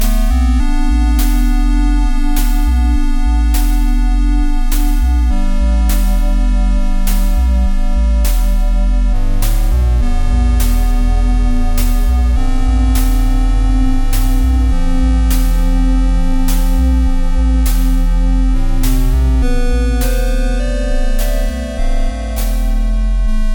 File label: Gameover; Vidiot